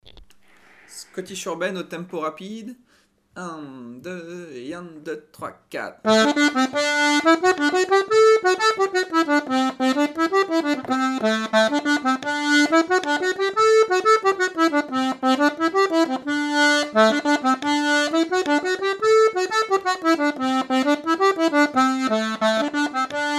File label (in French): scottish urbaine rapide; ateliers diato 2; L'atelier de la casserole